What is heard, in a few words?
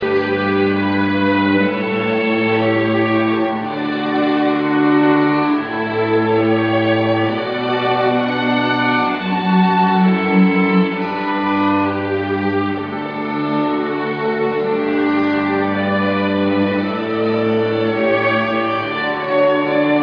March